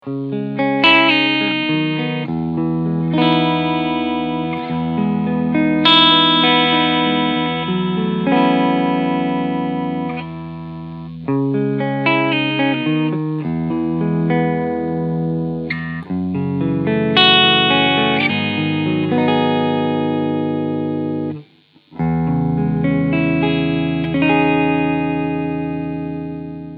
Cleansound beide PUs

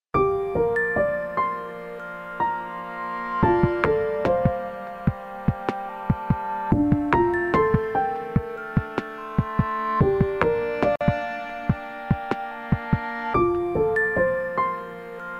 M8 Tracker Songbeispiel; Dirtywave

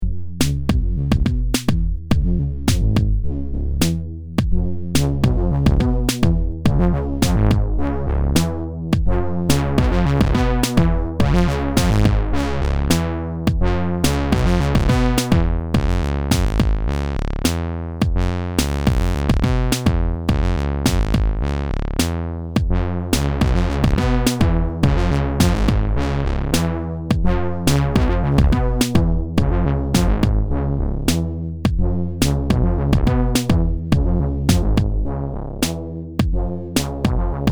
SAW colour noise auf filter

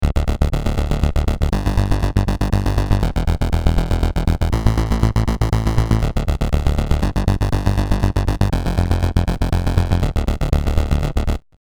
der arp; bx oberhausen

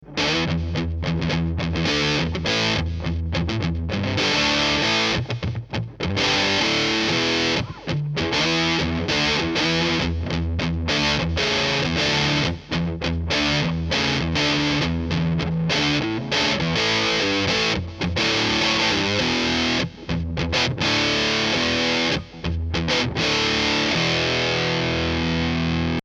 metall

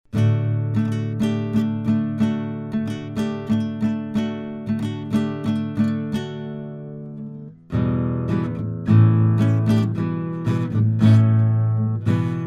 MOTU M6 Akustikgitarre

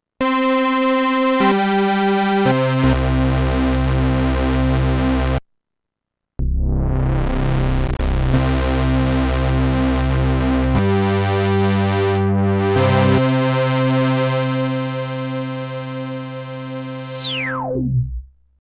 oszi diva

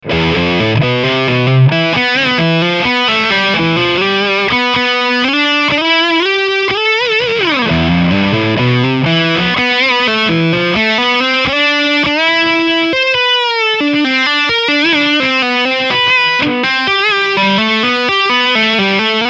Lick Steg HB Overdrive

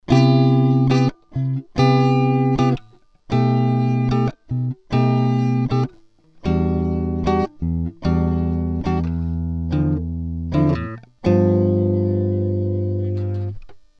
Gitarre an MiniFuse 2 Hi Z; Arturia MiniFuse Recording Pack

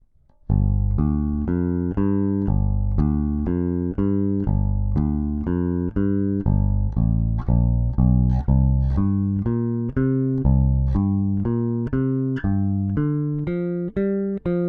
AER AMP One Two Three Bass Regler cut

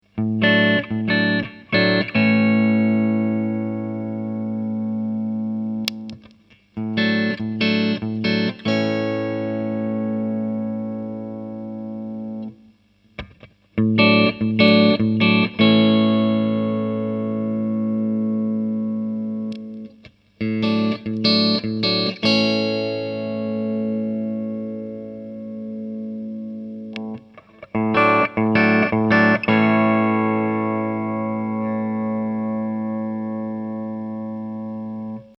clean hals bis steg

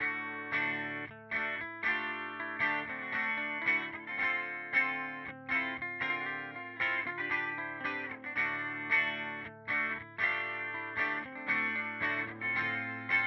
Twin Reverb Pur; HX Stomp

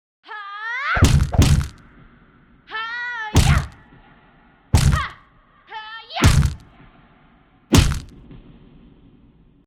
Fight Szene

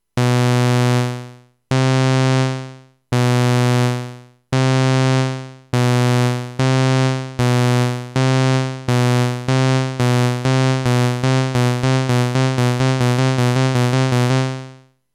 DSIP12M Filterunregelmaessigkeiten

